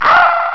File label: cry